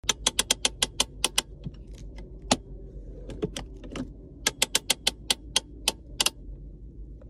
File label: Car handbrake